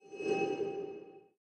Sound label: symbol Light Up; templeofnudges